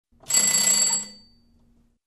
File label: Ringing Bell